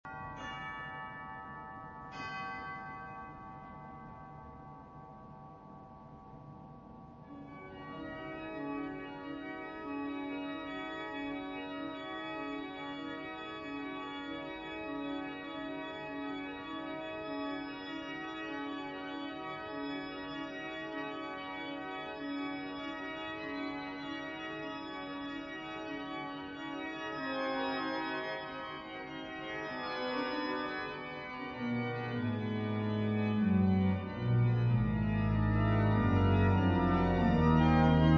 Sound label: bell carillon